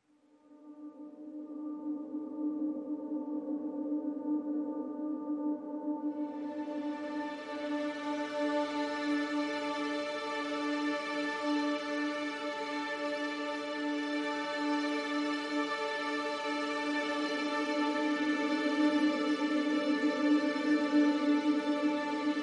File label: PADS Ghostly Whispers (D)